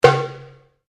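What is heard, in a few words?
Drum Darabuka Slap fortissimo, Egyptian Darabuka Drum; Drums; Musical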